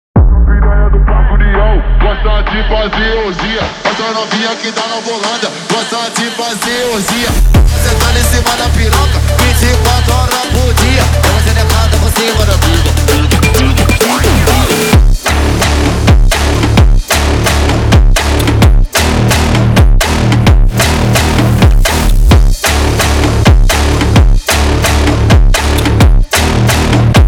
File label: Phonk